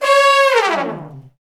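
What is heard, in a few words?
Brass Fat Falls; Roland L-CD702; Fat Section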